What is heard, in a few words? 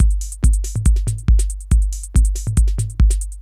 techno 140